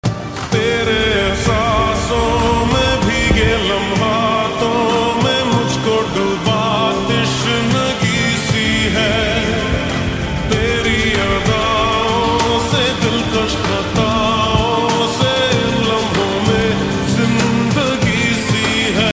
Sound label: love song ringtone